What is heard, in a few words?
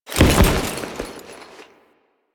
Foley Land; Warframe; Kahl